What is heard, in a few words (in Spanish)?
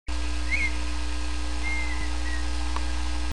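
Colorada, Rhynchotus rufescens, Red-winged Tinamou; cantos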